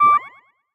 start; Ben 10 - Spot The Difference

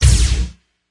hit obstacle; The Powerpuff Girls - Trail Blazer; NextlevelGames

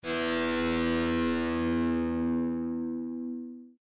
fire long chord; Adventure Time - Elemental; ThrillGames

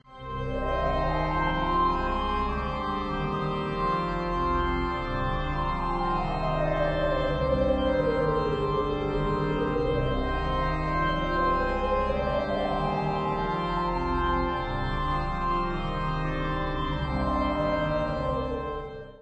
music loop; Be Cool Scooby-Doo! - It's Dark Out There; ThrillGames

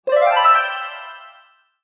add To Harvest; Food Farmer

